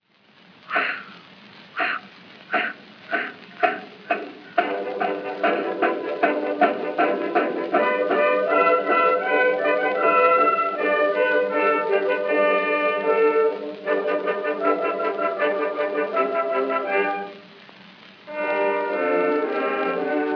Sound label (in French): train